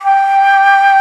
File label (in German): FLOTE SOFT; FLOETE; Best Service; Alpine Volksmusik